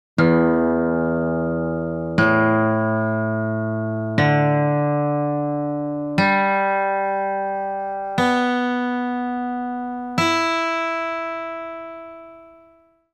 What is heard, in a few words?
tuning check guitar; Hear all strings in sequence